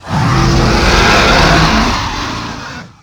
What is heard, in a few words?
dragon voice